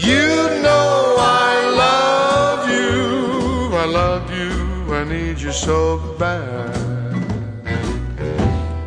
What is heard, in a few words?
you know i love you, sampled music loops